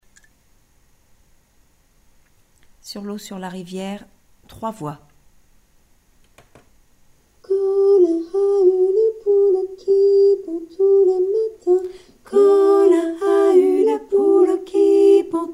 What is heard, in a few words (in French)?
sur leau 3 voix